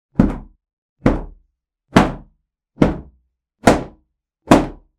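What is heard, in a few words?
towel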